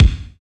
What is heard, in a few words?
KIK, Cheez Kiks; Club-50 - Foundations Roland